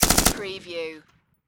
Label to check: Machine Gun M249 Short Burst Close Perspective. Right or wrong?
Right